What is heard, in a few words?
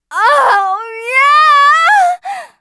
knock; TrueCrimeStreetsOfLA; XBOX